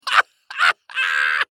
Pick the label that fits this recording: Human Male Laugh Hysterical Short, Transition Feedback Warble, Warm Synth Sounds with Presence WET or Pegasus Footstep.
Human Male Laugh Hysterical Short